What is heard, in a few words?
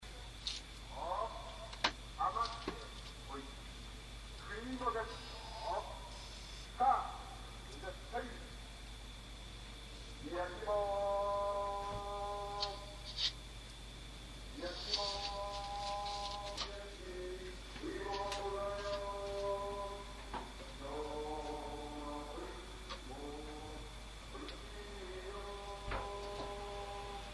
yakiimo window closed